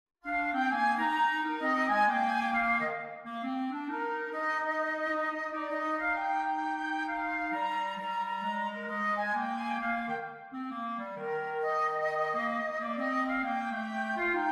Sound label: jazz Fl Clarinet, swing for flute clarinet duet; hark the herald angels